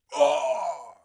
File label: voice damage male; Blind Gladiator